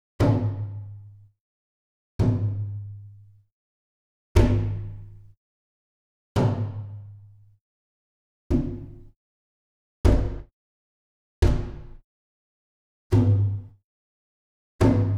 Gamelan; Propeller Island - Complete Gamelan

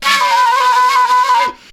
FLU + VOC, FLUTE + VOCAL

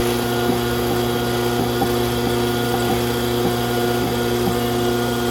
grease disposal alt gurgle

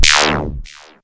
fx scifi; Ueberschall; Techno Trance Essentials

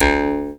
D JAW, Primitive Instruments; Big Fish Audio - Didgeridoo and Other Primitive Instruments; JAW SIMPLE

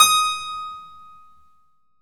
KEY HRPCR, Roland; Roland - LA Composer vol. 1

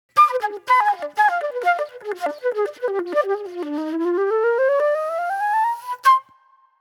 Super Soul Flute; Sonic Foundry (Sony Creative Software); Manhattan Flutes; The Funky Mama

